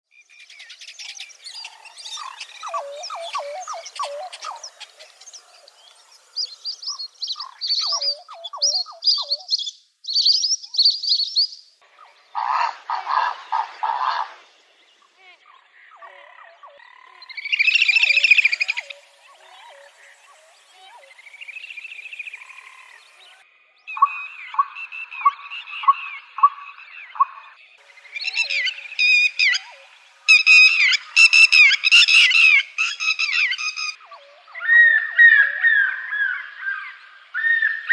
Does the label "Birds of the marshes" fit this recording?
Yes